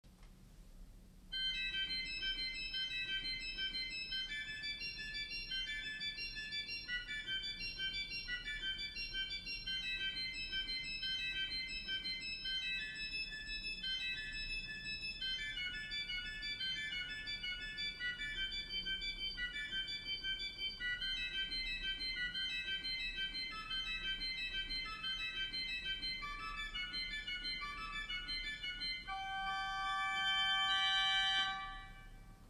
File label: Organ Swell Scharf III